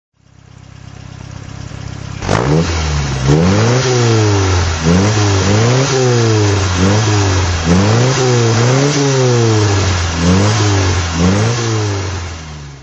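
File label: tr3 throttle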